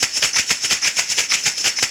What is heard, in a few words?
Percussion Loops, Shaker & Tamb; Houseworx